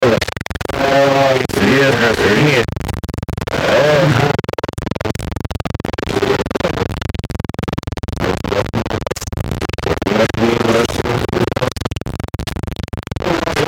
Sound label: Signal Failure Distortion Static Hiss; Blastwave